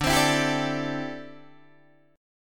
D 7th Suspended 2nd Suspended 4th D, Guitar DADGAD; D7sus2sus4; strummed; 12,15,14,12,12,12